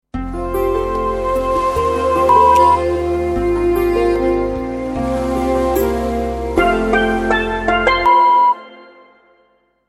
Sound Logo mit Sound Bett 5 Toene Dream

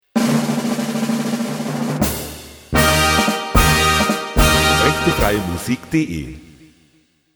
Tusch ta ta ta Wirbel

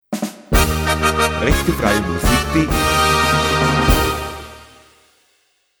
Tusch Karneval

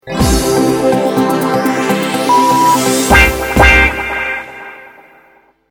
Sound Logo mit Sound Bett 2 Toene Sweep; Soundlogos für Ihr Corporate Design

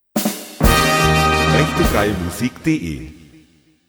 Tusch ta

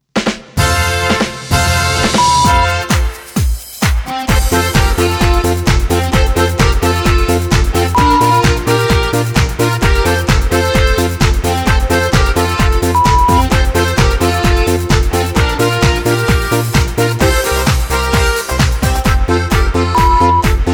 Techno Karneval

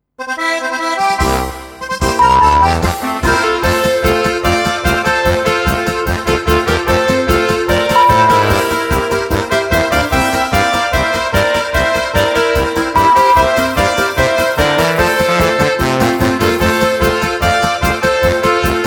Oberkrainer Polka 148; Volksmusik, alpenländische, bayerische Musik, Zillertal-Sound, Oberkrainer; Zither

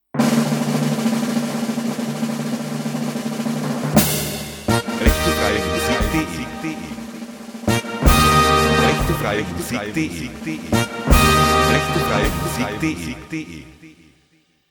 Tuschkombination 3x tata

